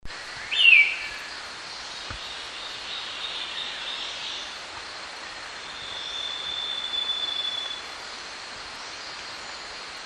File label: green backed robin